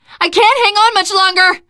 ledge hang middle, teengirl; source engine; left 4 dead 2

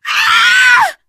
death scream, teengirl; Left 4 Dead; Source Engine; survivor

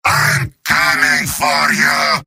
yell; heavy; video games; source engine; tf; mvm

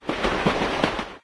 travel exit windows; windows 9x; sound schemes

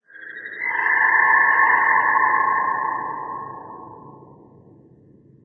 dist grub, ambient, caves; video games; source engine; portal2

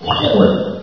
ichy pain; source engine; hl1; video games

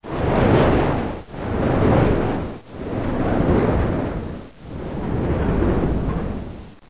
fan off; fans; Source Engine; bshift

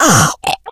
death, infected

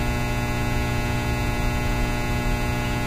light hum; Source Engine; tf; lair

